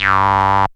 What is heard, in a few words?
SYN TON, ARP SYNTH; E-Lab; X Static Goldmine 2; akai